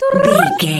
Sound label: voice fun character vocal crazy